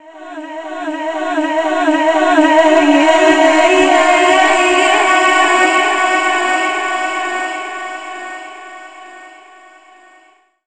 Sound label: VOICE FX, Dance Vocals